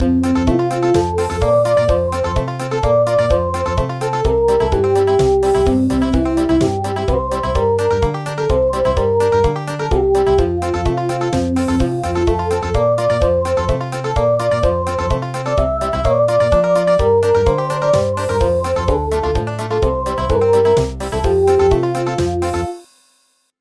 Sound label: Country